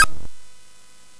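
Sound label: Nebulus, MOS 8580 SID chip music, games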